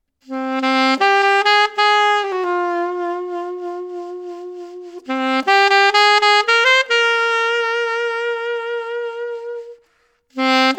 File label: Alto Reverb, alto saxophone mouthpiece; js custom nova 6 alto saxophone mouthpiece